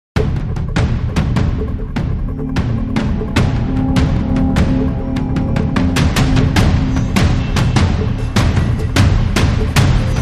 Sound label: Dramatic Sting, Less Cymbals